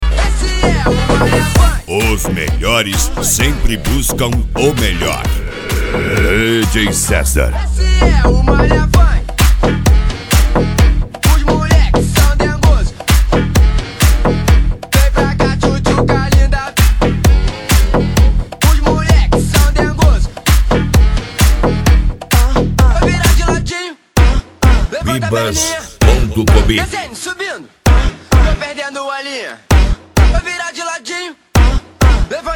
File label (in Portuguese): sound car carnaval